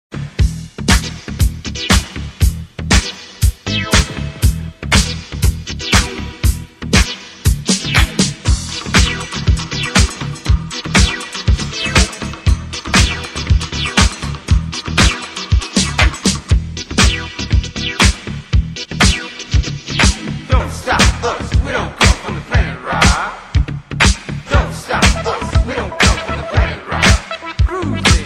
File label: Old School Rap Dance